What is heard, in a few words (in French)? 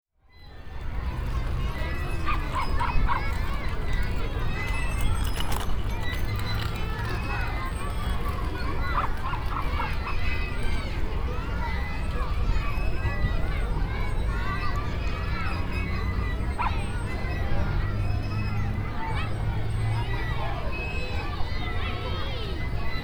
bogota tercer milenio chant balancoire